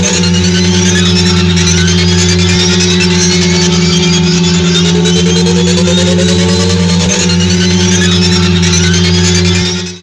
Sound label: turbo